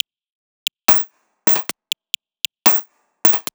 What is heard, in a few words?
Bpm135 Plucks Top Loop, Dubstep; Perc Loops